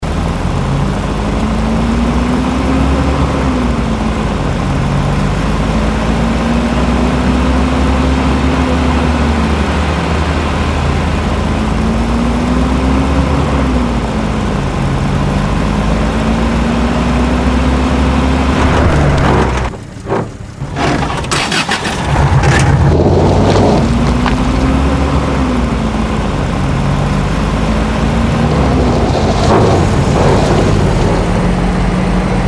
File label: Jeep Ride Audio; vehicle; mohaa